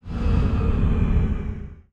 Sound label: Zoom Chunk; Insanely Twisted Shadow Planet